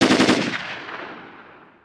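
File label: dist gun, distant gun, ambient outdoor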